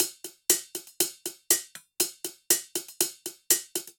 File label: EFX Small Cymbals; Drum Kits; Ableton; Drum Booth